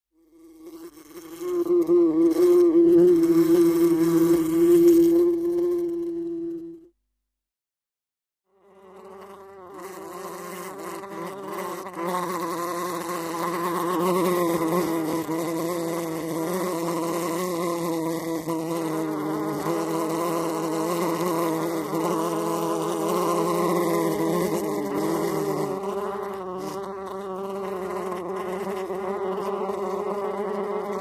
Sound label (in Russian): muhi zhuzhzhat mnogo muh